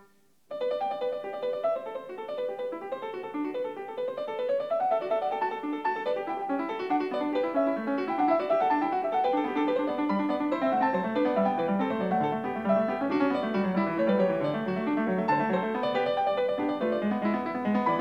Fugue No. 15 in G major, Bach, Well-Tempered Clavier; BWV884